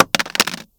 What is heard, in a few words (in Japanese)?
もの落とす